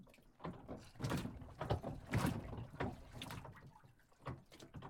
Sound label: docks; baileywiki maps premium towns